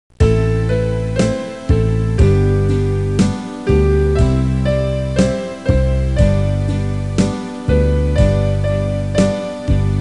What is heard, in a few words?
BASS